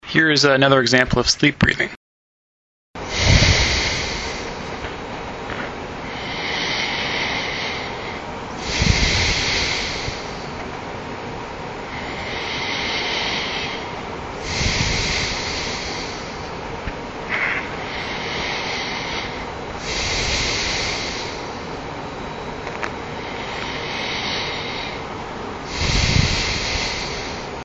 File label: sleep breathing, Lucid Dreams; Lucidology 102; field reports; timerless quick switches